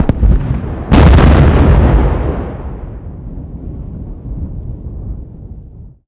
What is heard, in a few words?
explosive explosion effect bigger; Earth and Beyond; EA Games